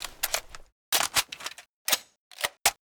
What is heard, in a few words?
ar15 reload; STALKER